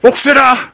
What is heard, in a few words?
sniper laugh evil